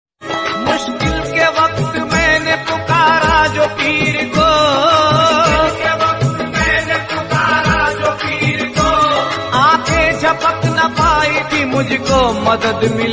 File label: qawwali